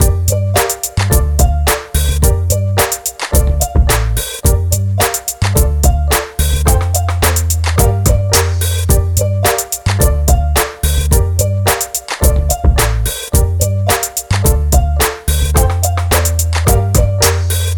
Upbeat Hip Hop Loop

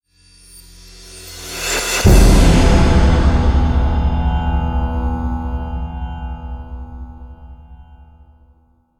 Trailer Hit; Spartan Deja Vu

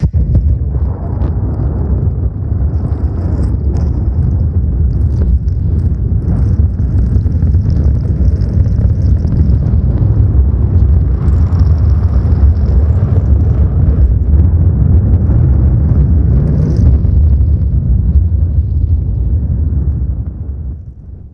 Condom rocket

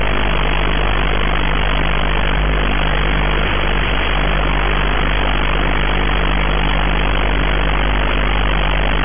Short Wave Radio Noise

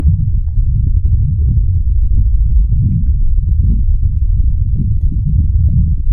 Deep Sub Rumble Drone

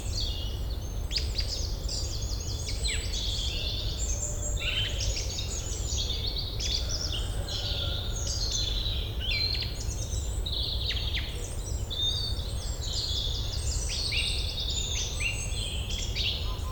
Edge Forest

